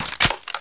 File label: mp5 clip in; svencoop